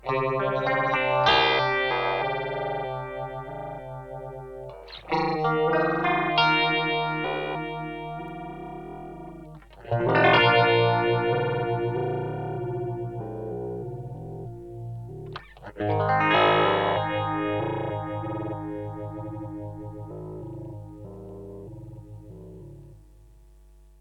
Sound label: Radius Phaser Square LFO; Red Panda